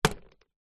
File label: leisure toy plastic large figure walk single footstep wood